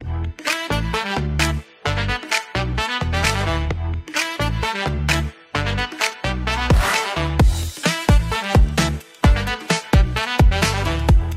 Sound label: cyborg groove